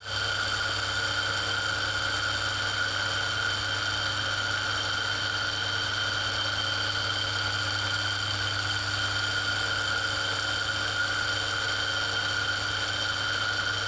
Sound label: MACH, MACH N SM; Total Control - Action Cutz